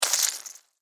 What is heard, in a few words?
Insect Spider Bite; Spider Sound Effects; Spiders (Sound Effects:Animals:Insects); SFX Bible 6